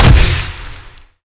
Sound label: hit